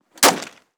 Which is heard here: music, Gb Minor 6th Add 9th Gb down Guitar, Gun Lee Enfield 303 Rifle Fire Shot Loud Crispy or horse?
Gun Lee Enfield 303 Rifle Fire Shot Loud Crispy